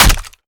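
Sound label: melee character gun medium steel; viper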